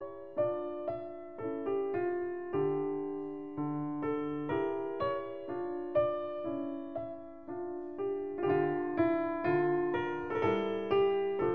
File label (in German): konzert c dur fuer zwei klaviere allegro moderato